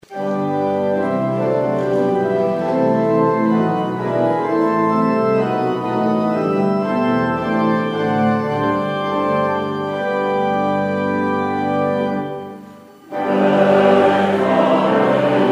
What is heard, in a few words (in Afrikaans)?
Gemeentesang